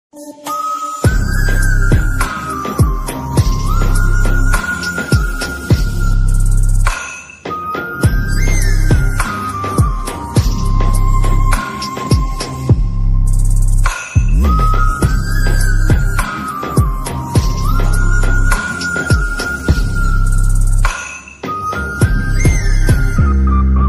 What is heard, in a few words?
romantic ringtones telugu love instrumental music ringtone